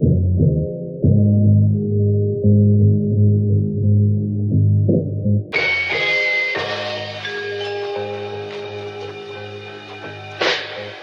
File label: horns, Loops Phonky